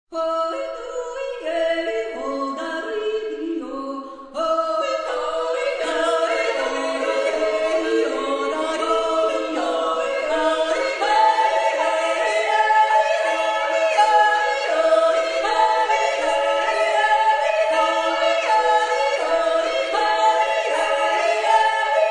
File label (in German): Kapellner Glaeut